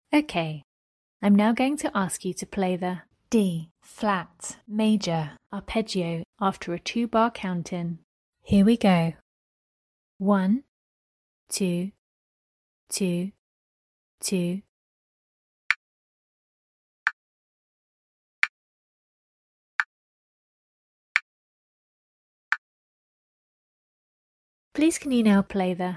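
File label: D flat major arpeggio G major arpeggio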